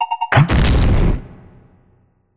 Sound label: cry